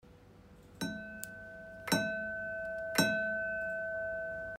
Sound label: Harmony Flower E5; Yellow